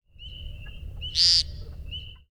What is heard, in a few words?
woodcock